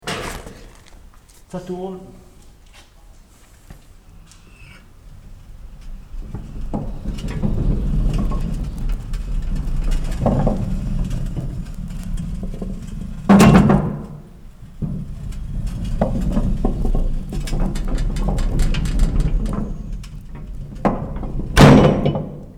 wagonnets

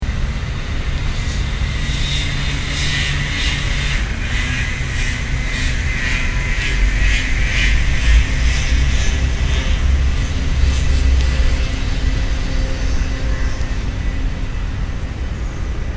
tremolo cyclo

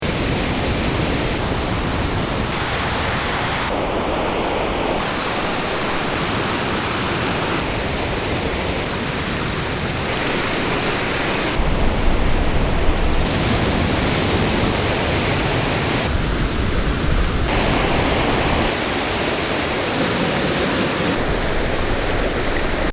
variations bruit colore

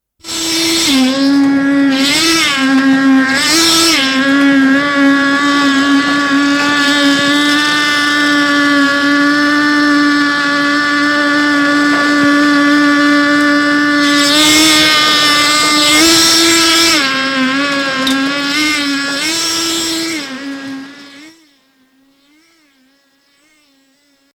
chantier vibreur; Culture sonore

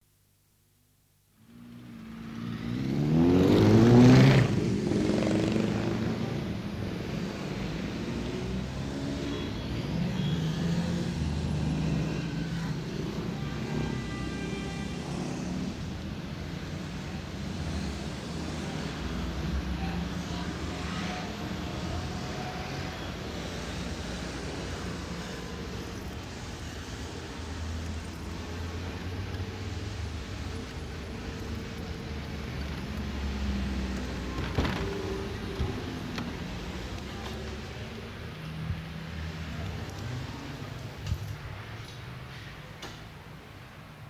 boucan

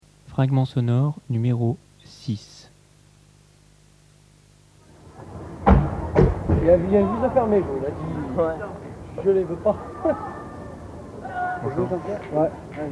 Effet Staccato; Dimension

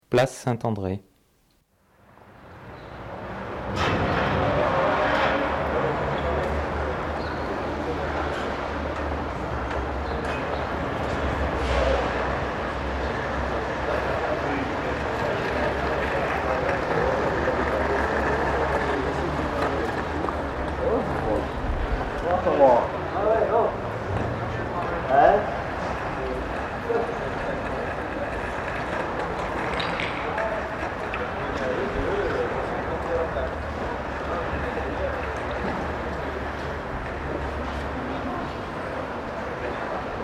ambiance place

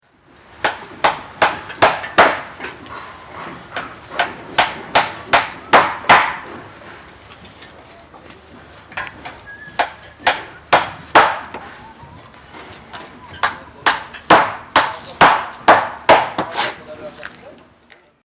chantier rythmes crescendo; Culture sonore

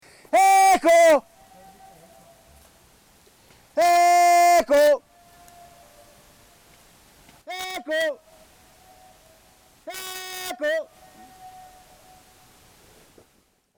FX echo montagne

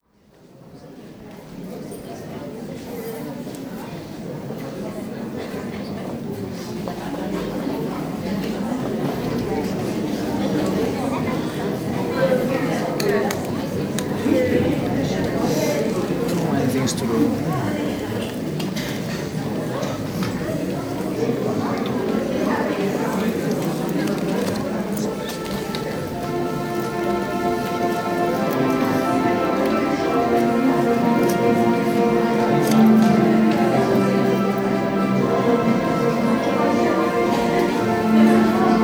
pardon entree; lesconil

